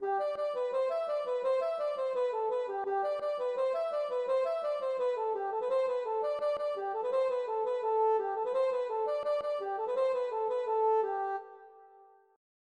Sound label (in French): passepied